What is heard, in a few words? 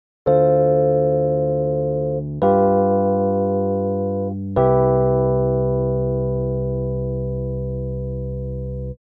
dm7 g7 cmaj7; jazz; Avec les accords simples (Dm7, G7, Cmaj7)